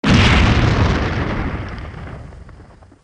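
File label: airplane bomb explosion; ariplane bomb; golgotha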